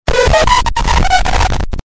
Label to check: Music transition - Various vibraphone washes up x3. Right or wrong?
Wrong